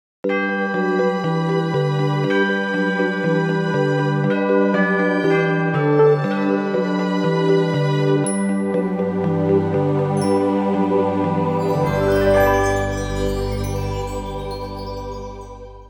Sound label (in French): Noel jingle; Jingles medley